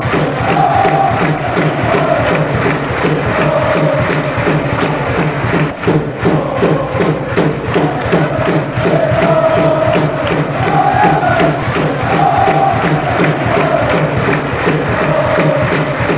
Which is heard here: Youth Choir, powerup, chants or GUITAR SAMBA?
chants